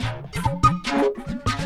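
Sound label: Music Dpad Base 145; from dust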